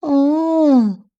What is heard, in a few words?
Animal Mammal Herbivore Rhino Call; animals; AdobeCC